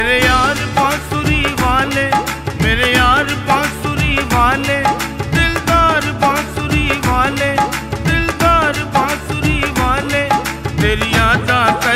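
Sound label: Ringtone, bhajan; krishna bhagwan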